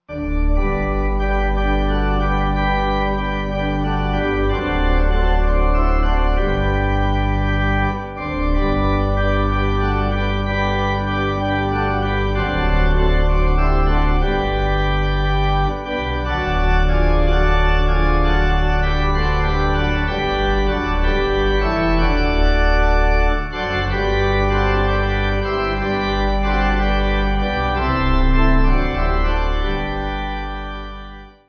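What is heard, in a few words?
Pipe Organ, Music Backing Files for Churches; New Jerusalem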